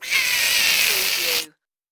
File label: Critter